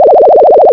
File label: phone